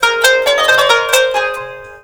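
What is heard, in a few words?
KANU, Oriental; Best Service; Ethnic Journey Sound Cube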